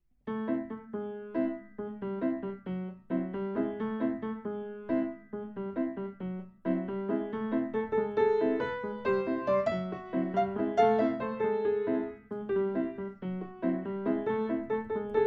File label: Bailando salsa